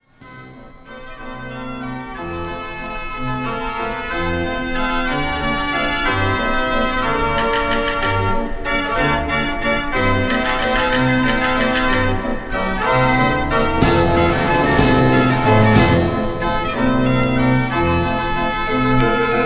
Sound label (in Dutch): draaiorgel; de turk onyx